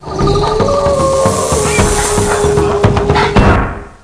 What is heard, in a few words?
Jungle Beat Drum